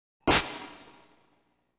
TRAP Crossbow Bolt; Game Sound Legend Series Apollon Game Music Box Memorial Sounds of Wizardry 2006

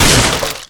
Pirate Barrel

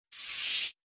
Rattle Snake; gamerip

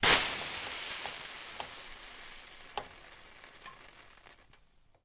Sizzles

